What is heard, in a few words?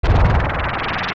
Appear; OOT